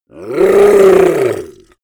yeti growl painful; kreaturen

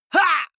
male fight hit shout; comedy-cartoon; soundpool

